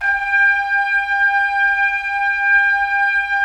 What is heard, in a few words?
Trumpets; Roland - LA Composer vol. 4